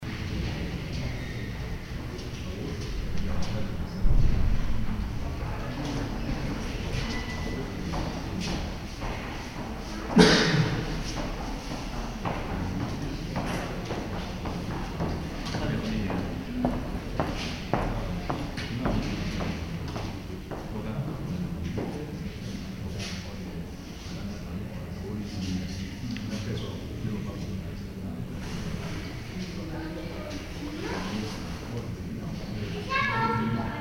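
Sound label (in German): Bürgeramt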